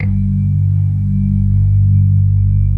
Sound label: HAMMOND G1; Jazzistic